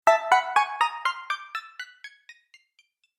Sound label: Bright Sound Video Game